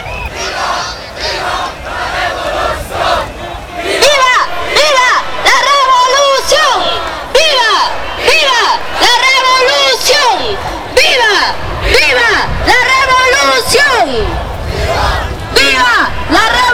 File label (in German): revolution parole; best service Blue Box